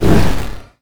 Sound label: dragon hit; Crypt of the NecroDancer